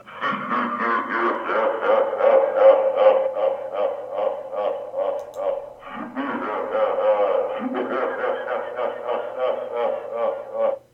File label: evil laugh